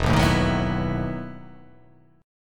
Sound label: E Major 7th Suspended 2nd Suspended 4th